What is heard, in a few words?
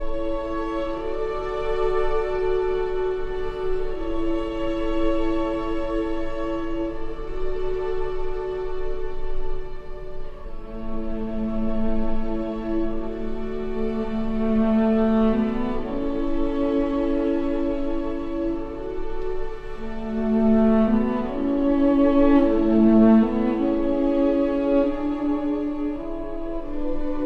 for cello and strings; Archi di Santa Cecilia